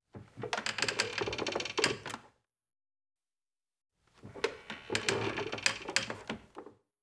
wood creak light; Call of Duty Modern Warfare

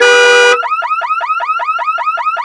vehicle car alarm loop

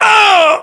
death, english

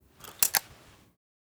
revolver prime striker; Rust

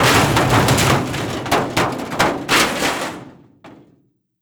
metal gib; Rust

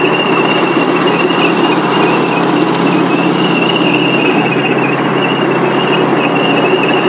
Vehicle Engines; Red Orchestra Ostfront 41-45

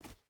footstep hide gravel jump start; Rust

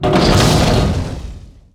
objects, World of Tanks

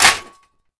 Armour Hit; Civ4 warlords

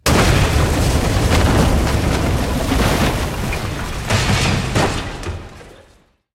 building crash, tanks; War Thunder

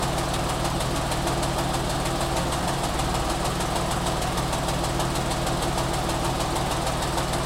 load interior engine loop, tanks; War Thunder

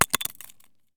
grenade collision smoke russian; Escape from Tarkov

